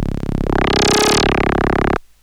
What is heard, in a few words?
Monotron Sci-Fi